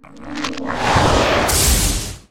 Trifead Attack

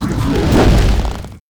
WAR ATTACK; SIZZLER; Mob

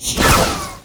beamgun; Weapon

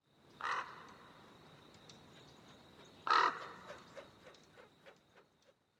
Tree Bird, ambience

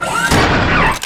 Attack; Kwelersokum; Mob

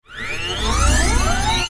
WAR POWERUP; ARTHROP; Mob

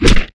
Arrow Skin, Damage

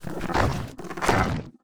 PEACE WALK, Mob; GRAVELAPIS

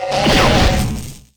Attack

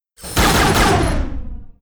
war attack; HOBOROBBER

